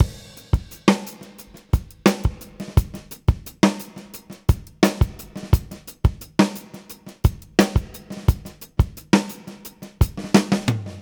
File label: Herbie, 087, Beat Loops; Zero-G; Monster Beats